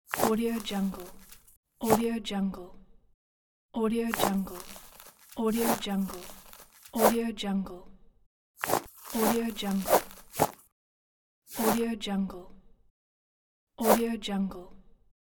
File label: shoelaces